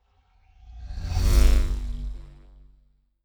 sci-fi vehicle drive by buzz; raumschiff

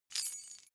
key ring large fly tinkle; objekte; schluessel

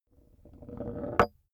small metal rolling on wood stop; industrie wirtschaft; metall

